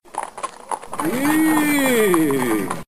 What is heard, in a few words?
game change market